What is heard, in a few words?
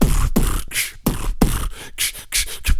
lipo suction; Spectrasonics; Planet